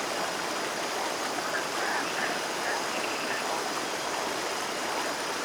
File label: Water Fall; aKalima